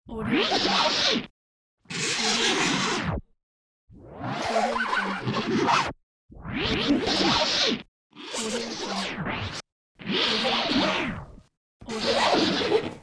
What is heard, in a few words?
short vinyl scratch sfx pack; warpjumper